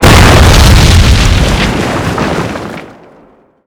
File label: Amb Destruction; Red Orchestra Ostfront 41-45